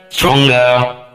vault hit stinger; sinners

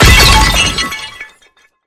pillow projectile impact; familiar; rem sounds

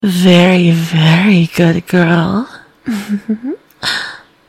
music stinger; killstreak music

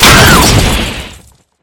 pillow hit; familiar; rem sounds

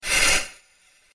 slide exit; Jet Set Radio movement sfx

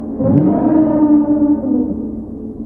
cat mine meow; prowler sfx

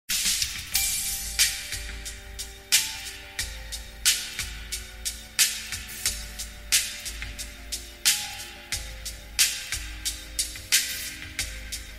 music hideout play base lp fx 155bpm; Risk of Rain music pack; in-game music

